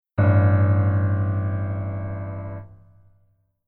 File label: Keyboard Voicing